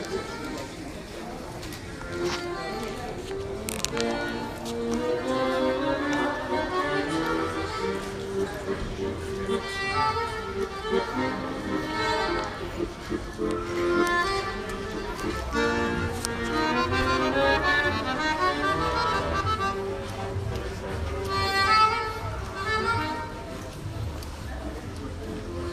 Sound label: Music Through The Streets Of Pavio